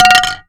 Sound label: Aluminum Collision, Bat; zps; Foley